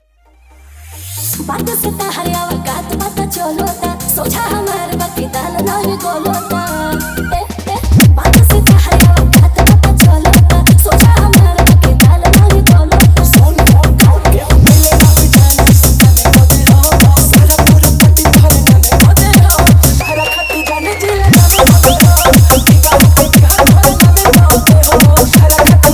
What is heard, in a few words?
Bhojpuri Dj Remix